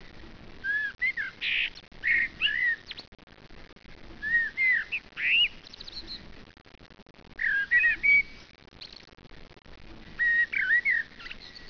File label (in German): amsel; voegel